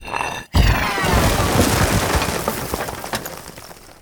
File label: portal destroy